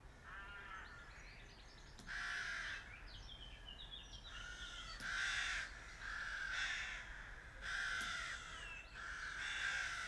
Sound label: squabbling Rooks